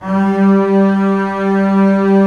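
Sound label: bass G2; Digital Sound Factory Virtuoso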